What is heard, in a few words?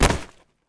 bodyfall, foley